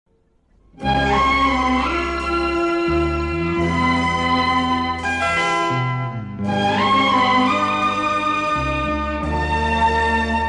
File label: Female; Naa Mumkin 1988